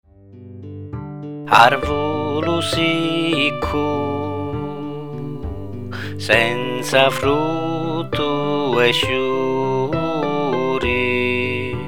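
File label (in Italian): settimana santa voce strumenti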